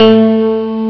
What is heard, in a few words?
d50 synth bell mid, Amiga Soundtracker Sample Packs